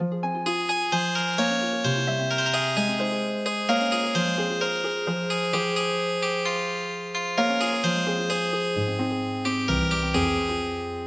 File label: Healing Harp